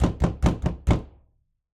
Door, Wood, Bathroom, Exterior POV, Hit, Pound, x5, Impatient; Household